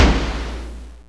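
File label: platform stop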